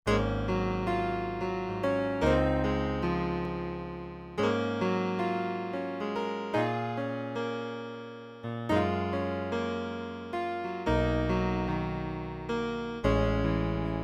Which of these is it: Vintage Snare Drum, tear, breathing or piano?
piano